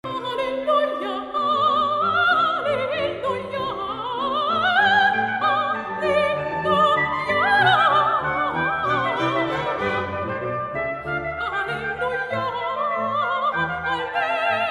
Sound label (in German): Sopran, Alleluja; Jauchzet Gott in allen Landen